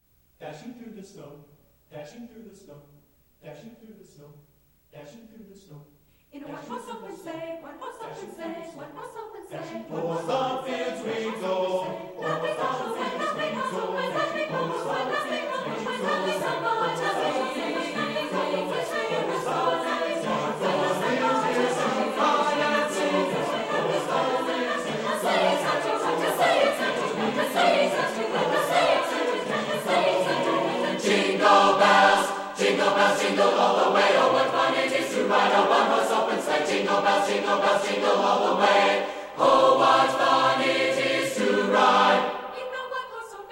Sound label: jinglebells